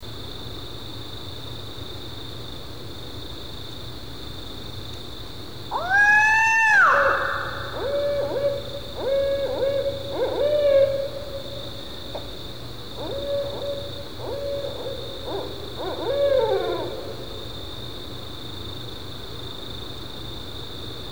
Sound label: owl scream